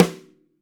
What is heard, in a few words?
snare